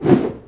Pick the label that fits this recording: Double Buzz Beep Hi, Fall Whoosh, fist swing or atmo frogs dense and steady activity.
fist swing